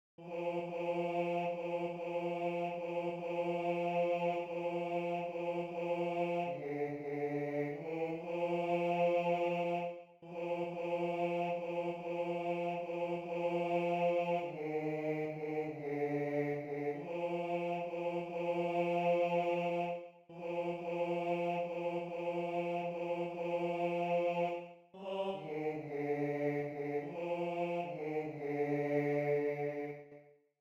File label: Bass I; Untreue